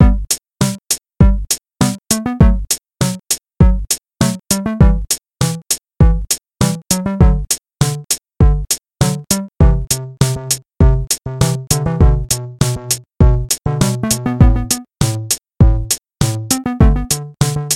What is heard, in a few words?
bgm; Season Land